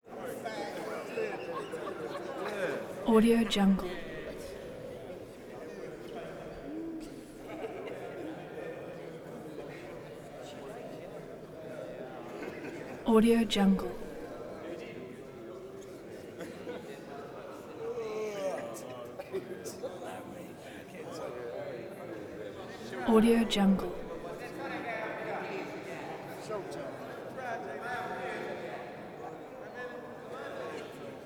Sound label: prison crowd recorded within audience